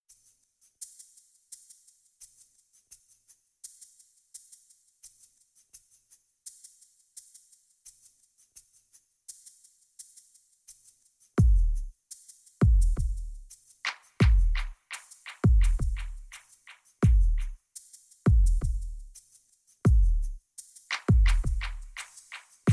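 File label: percussion only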